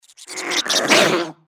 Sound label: giant rat chase vox; npc